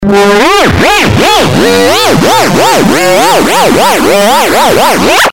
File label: odd tones